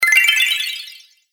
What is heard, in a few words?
Magic Tokens Game Fx; Video Game Sound Effects